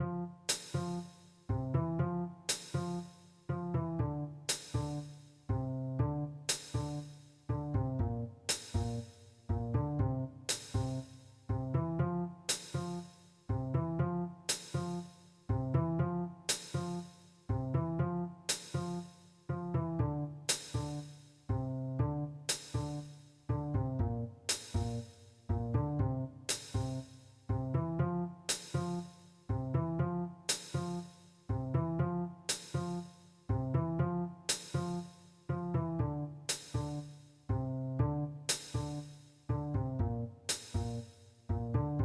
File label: bass riff